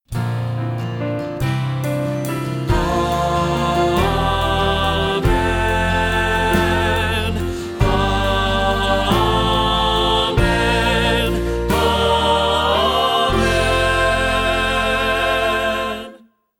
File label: Amen